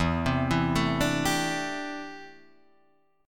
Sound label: A Minor 11th E, Chord